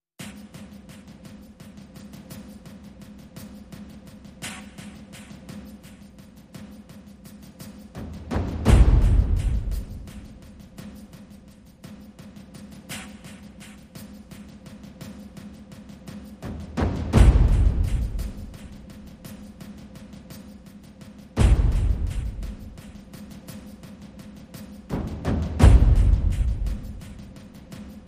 Perc Only

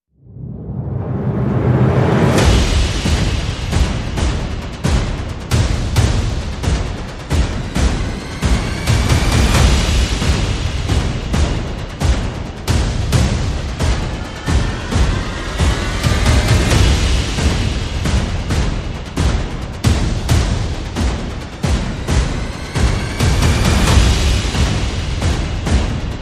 Perc And FX Only

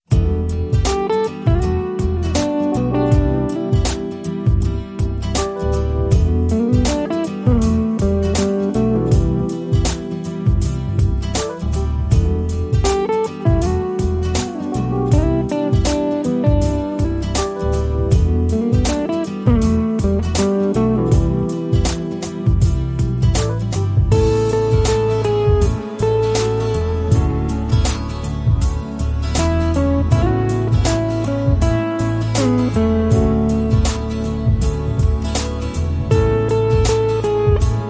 Relaxing Lifestyle

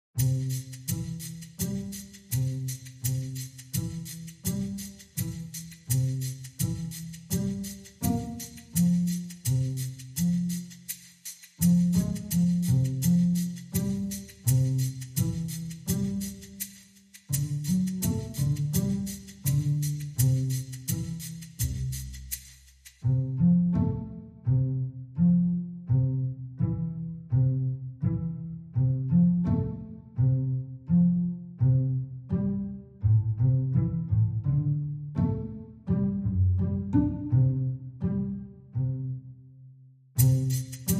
Percussion and Bass

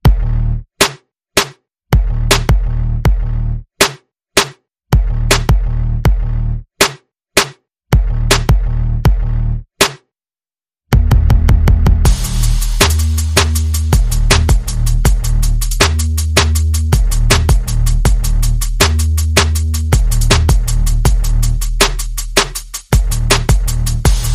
Bass and Perc Only

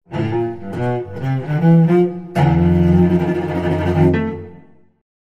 Cello Rock Shuffle

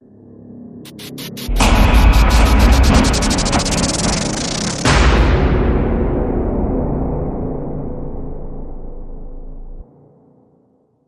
Hit Missing

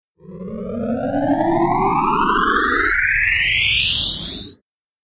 Energized

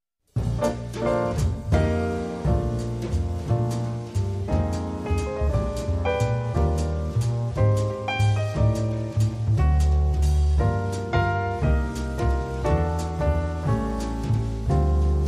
Guitar Waltz

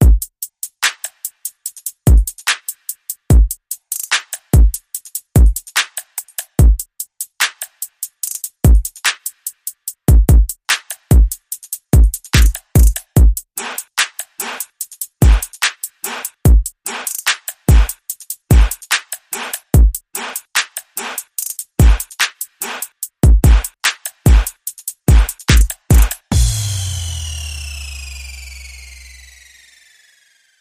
Lil Booty Trap Beat

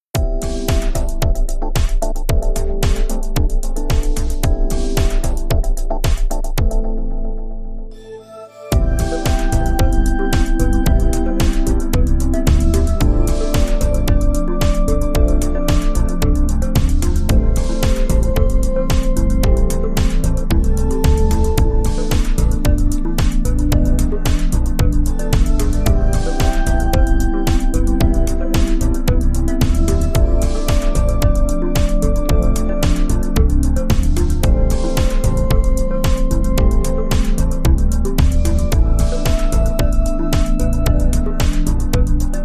The Trance

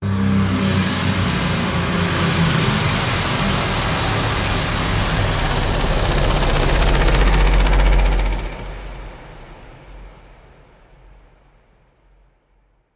Crash Landing; Pw Dn

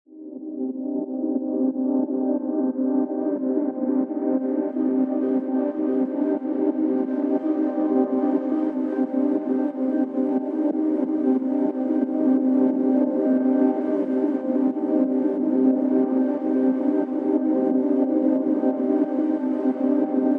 Synths

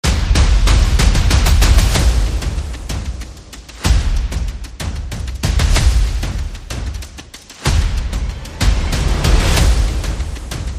Percussion and Effects

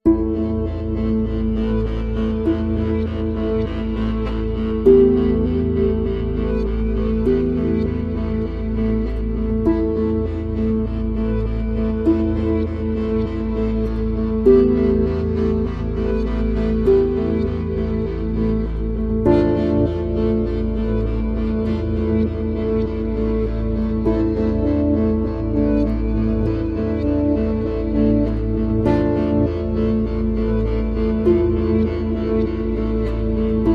No Ebow Guitar

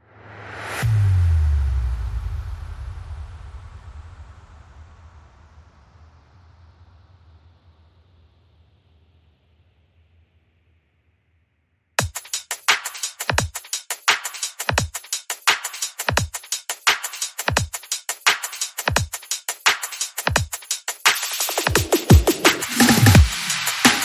Drums Only

